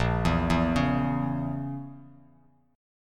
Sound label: Bb Diminished Bb down Bass Standard; arpeggiated; JGuitar